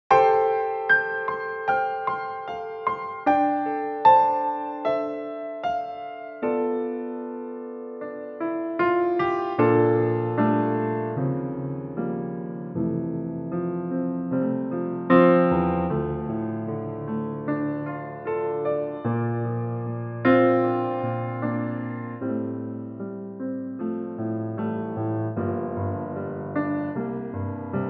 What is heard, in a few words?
piano F; key - F - vocal range - C to D